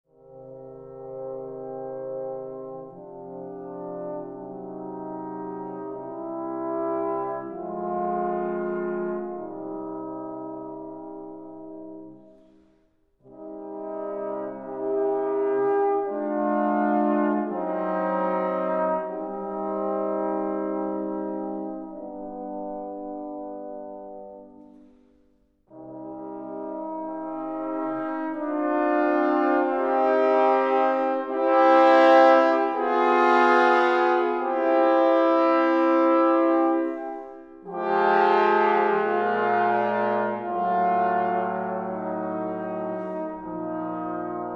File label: 2 Equali in C Minor for 3 Trombones